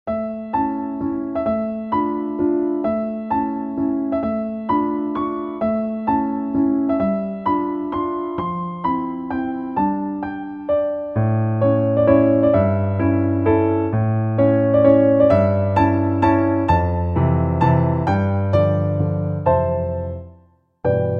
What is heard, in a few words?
music relax; Scratch1000圖庫